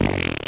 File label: Fart